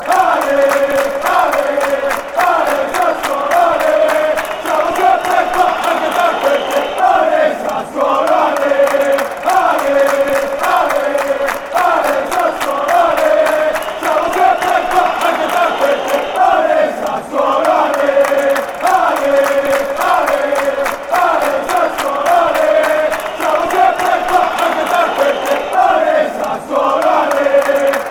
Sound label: ale, fan chants